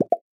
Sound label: message answer